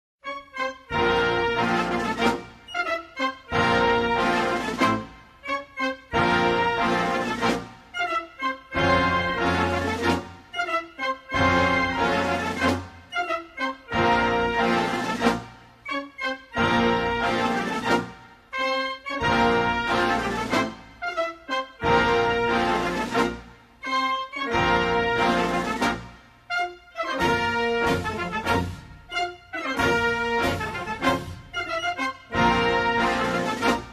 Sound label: National Anthem of Mauritania